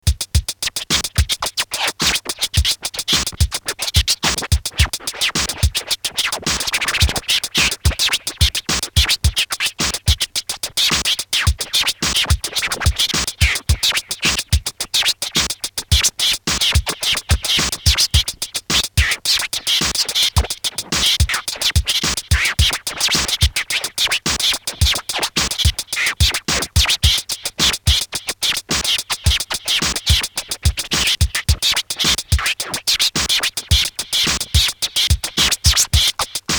hardcore beat skratched

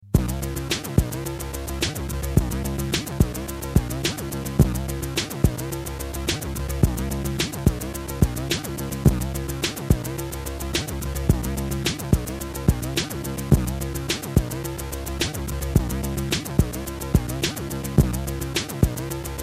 beat sketch

